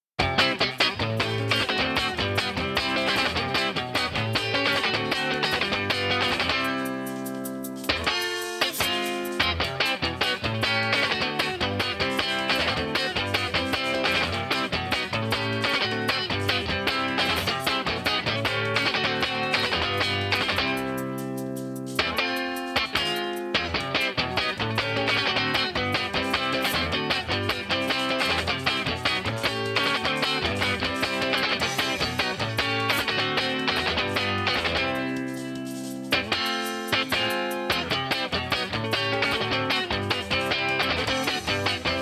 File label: guitar